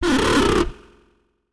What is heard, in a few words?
monster